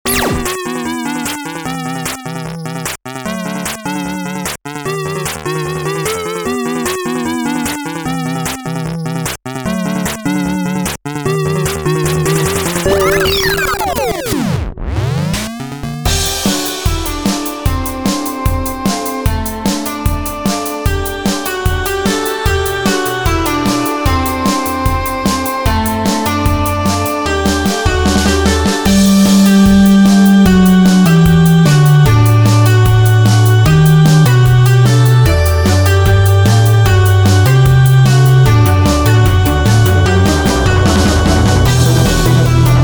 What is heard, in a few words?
Electro cover; GamBit; Guardian Legend; Forest Labyrinth